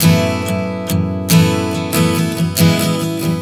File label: Strum 070 F#m, One Chord Strumming 070 bpm; Sonic Foundry (Sony Creative Software); Songwriter Acoustic Guitar Companion